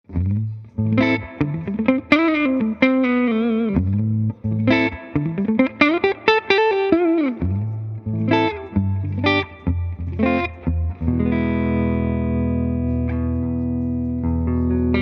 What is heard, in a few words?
clean; reaper hp; lonestar